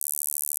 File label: Gauss Filt D2